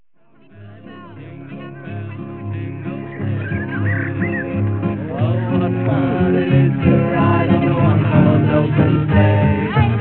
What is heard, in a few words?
jingle